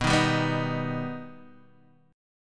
B Augmented B Guitar Half Step Down

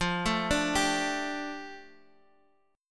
F 6th Add 9th F down, chord; F6add9 arpeggiated

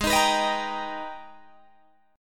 Minor Major 9th, Guitar, Open G; Gsharp; 18,20,21,20,20,20

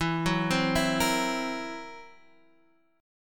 Fsharp 7th Flat 5th, guitar chord

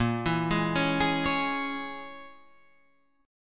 A sharp Minor 7th Flat 5th, Guitar, Open F; 10,11,8,8,11,8; arpeggiated

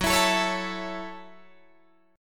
F sharp Minor 7th F sharp, Guitar Open D

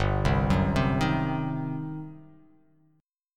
G Major 7th Suspended 4th Sharp 5th, G, down

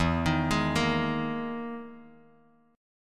E-Major Sharp 11th E, Bass Standard; EM#11 arpeggiated; 12,18,18,15; JGuitar

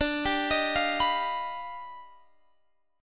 Eb Augmented Major 9th D

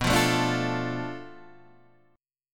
B Minor 7th Flat 5th B Guitar DADGAD

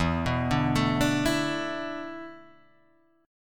E 7th Suspended 4th, Guitar, Half Step Down

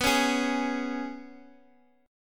G sharp Minor Double Flat 5th, guitar

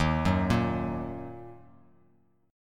D sharp Minor D sharp; arpeggiated; JGuitar

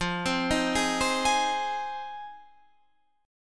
G sharp 6th F, chord

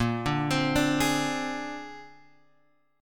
A sharp 9th A sharp, Guitar, Open G; 8,7,10,7,9,x

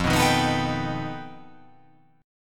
F 9th Flat 5th F down

